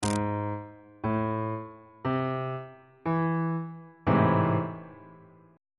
g double sharp minor major 7th 3rd inversion

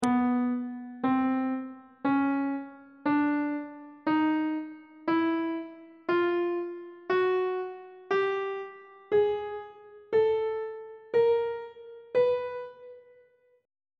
c flat aeolian mode chromatic scale 1 octave; on treble clef

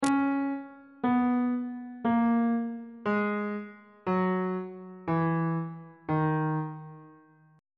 d sharp phrygian mode descending

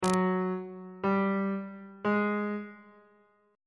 d double flat 5th intervals; bass clef

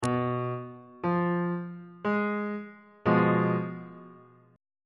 e sharp diminished 2nd inversion on bass clef

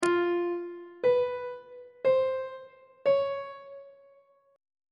f 5th intervals on treble clef

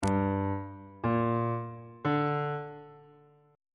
a double flat minor triad chord intervals; bass clef

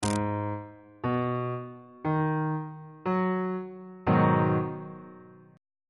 b major 6th 3rd inversion on bass clef

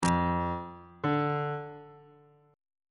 relative minor of f major is d on bass clef

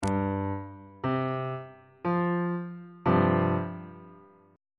e sharp suspended 2nd 1st inversion on bass clef

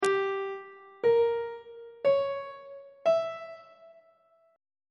g diminished 7th chord intervals on treble clef

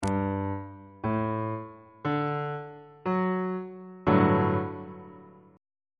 g major 7th suspended 2nd chord on bass clef

